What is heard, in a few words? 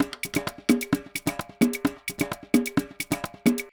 Loop Percussion 130